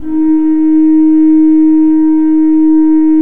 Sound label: D3, Cathedral Organ Koppelflute; Propeller Island